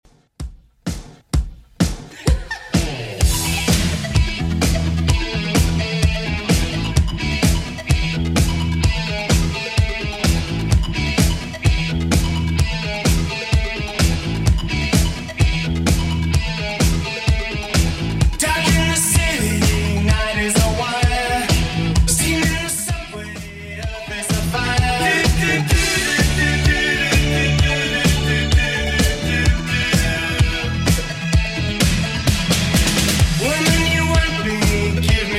80s Redrum 128bpm

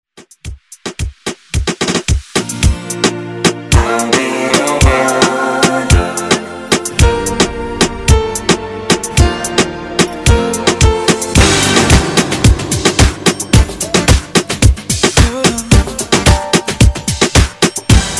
Reggaeton Redrum

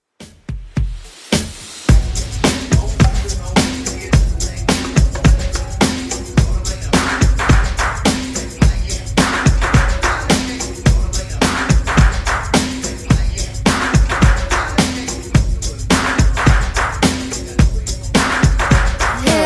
90s Rock Redrum 107bpm

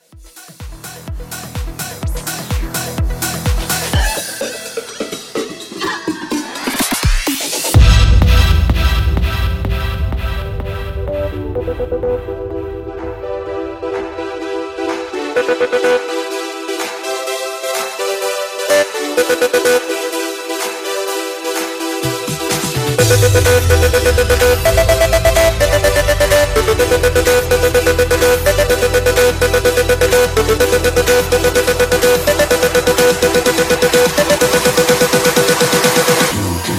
Festival 126

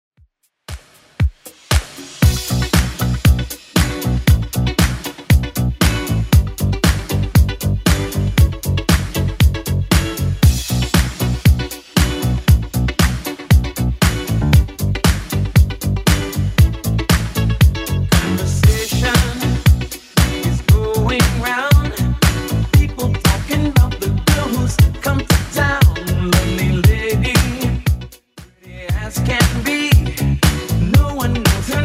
80s Dance, 117bpm; Redrum

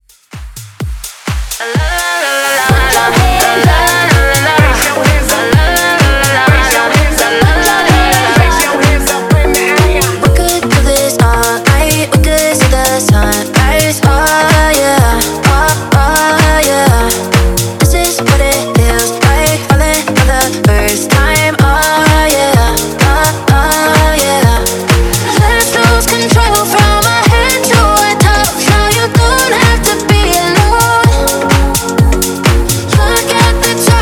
Top40 Dance 127 Bpm; ReDrum Hype

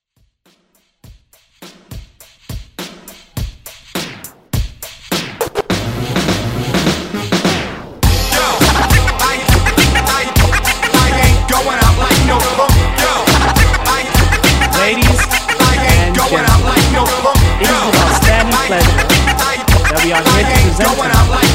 Old School Scratch Fixx

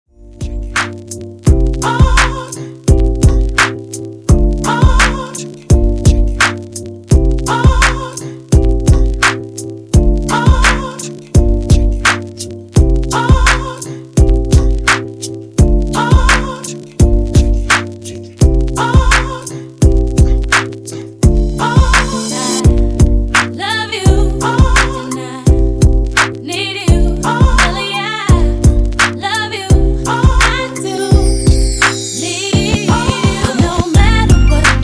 Extended 85Bpm

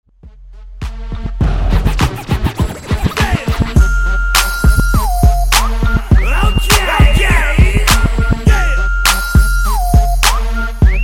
Rnb To House Transition 102 126 Bpm

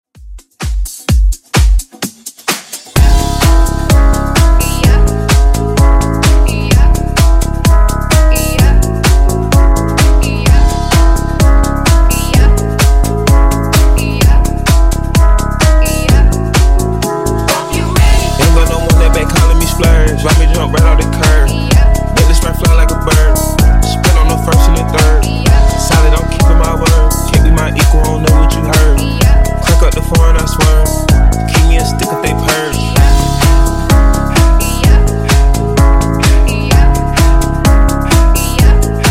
Funk Nudisco, 128Bpm; Bootleg

